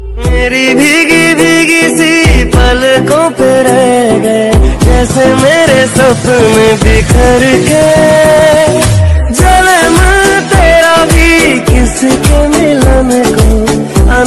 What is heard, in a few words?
hindi ringtone, bollywood ringtones